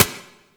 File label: Turret Low Clip; Lasrian APC Turret; Weapons